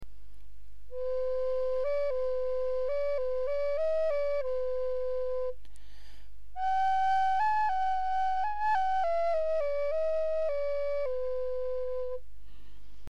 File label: flute